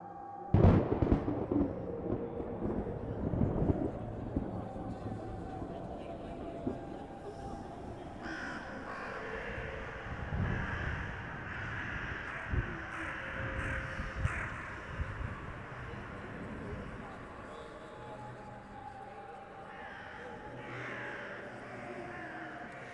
Halloween Audience Loop

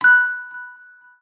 Sfx Basketball; Sfx Basketball Fever

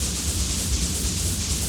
Balloon Shooting Fireballs Loop

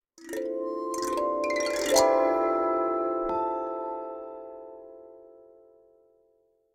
start expedition jingle; curious expedition 2 macos ps4 switch windows xbox one xbox series xs gamerip 2021

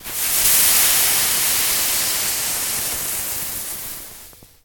Sizzles; Legend of Keepers

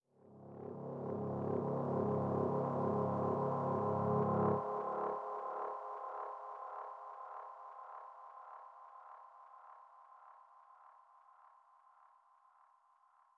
music instrument transition; music ftue instrument transition

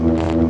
Laserb

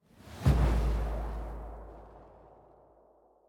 Card Pack; Rabbids Multiverse

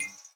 Battery Indicator Machine Light On

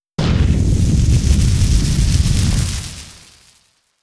Flame Heat

